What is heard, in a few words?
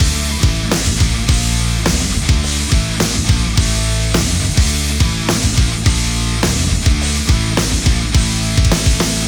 Metal